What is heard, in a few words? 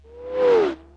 fast car; direcpc